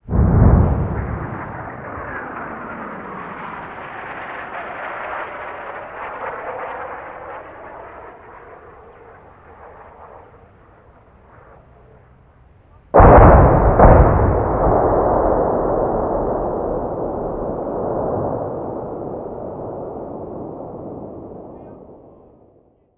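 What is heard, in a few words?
artillery medium; Call of Duty Modern Warfare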